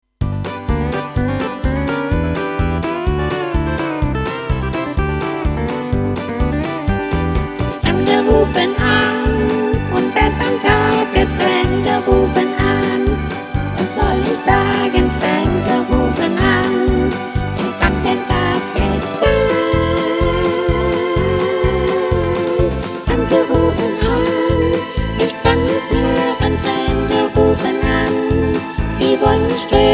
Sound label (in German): Fremde Gesang